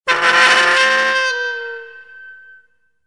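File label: zvuki metalla, metal sounds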